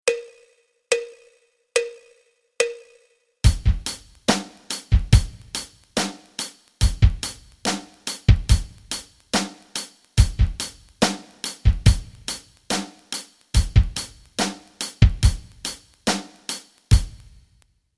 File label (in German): rock rhythmen